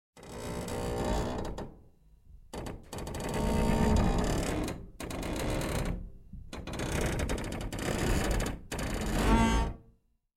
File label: creak metal; METALL; KNARR